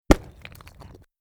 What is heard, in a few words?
shale break low end crackling rock